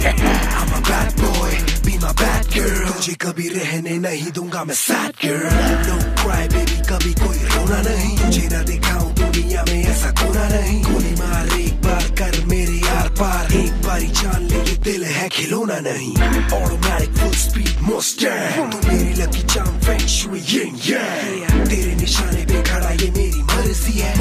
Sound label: Rap; Sniper